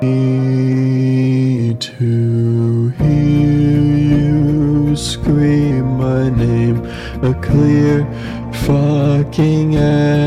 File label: autotune plus instrument